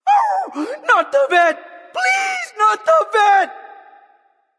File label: death; cerberus